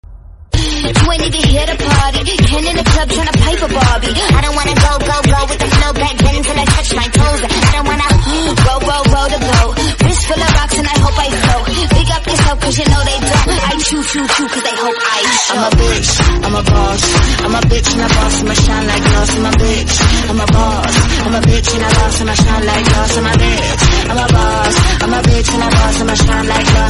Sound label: bgm